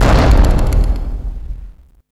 CYBERGUN L, Hard & Loud Techno; USB Soundscan vol.01; AKAI

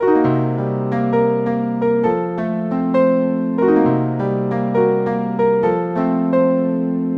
Piano, Acoustic Pianos

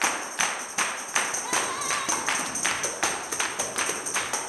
160 TAMB BRK, blues soul; Spectrasonics; Vocal Planet

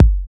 BD, BD Dance

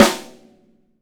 SONOR snare

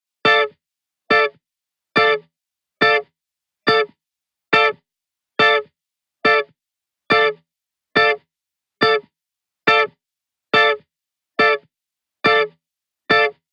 140BPM Dmin Guitar DRY, Offbeat Dub Guitars; Antidote Advent

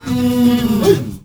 MALE WAR, SHOUT

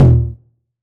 909 Tom Sweet; Goldbaby Tape808 & Tape909; The Tape 909

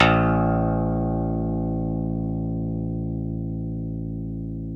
PNO, Electrics, Grands

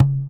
THROAT; Spectrasonics; Metamorphosis; THROATERS

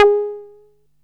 SOFT SEQ G#4, Alpha Dance; Best Service Alpha Dance vol.2; SOFT SEQ.2

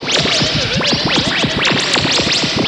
WILD VERB, AMB; ENVIRN-AMB

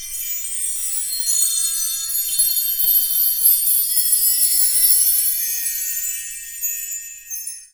BELL TREE; 3D Sound; 3D Keys VOL-1

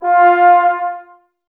Brass, Woodwind Brass Ensemble; Miroslav Vitous - Woodwind Brass Ensemble

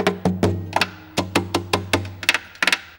GUIT M KNOCK; Best Service; World Colours

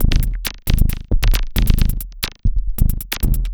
Drums Strange

